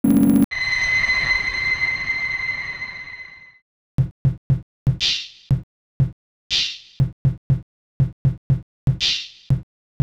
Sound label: Sine Wave